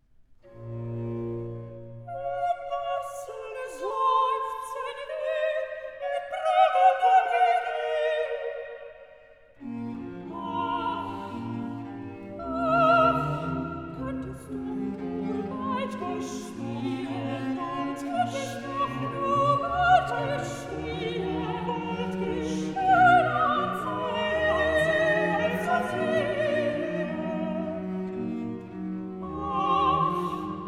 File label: Recitativo (A); Bach - Easter Oratorio; Bach, J S; Oster-Oratorium, BWV 249; Indessen seufzen wir